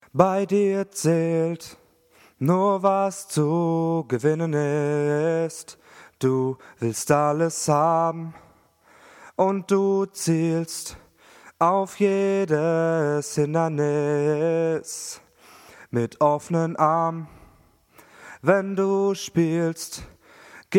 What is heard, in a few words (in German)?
Eigeninterpretation ohne große Effekte Rohaufnahme